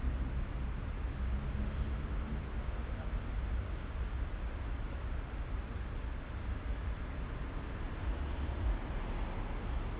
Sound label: noise